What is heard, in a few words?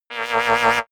sci-fi scanner wobble high